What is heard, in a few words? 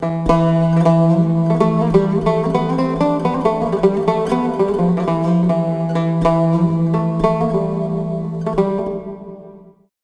SIERRA GUI; Best Service Advanced Media Trax Building Blocks